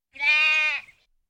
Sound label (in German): meckernde ziege; Auf dem Land